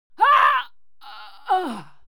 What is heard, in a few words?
human vocal female fight die; smartsoundfx